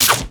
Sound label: decayed basic attack; LoL; yorick